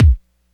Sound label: kickdrum C key, kick drum sound tuned to the C note. Loudest frequency: 266Hz